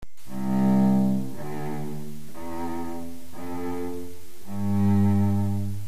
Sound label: cello; mozart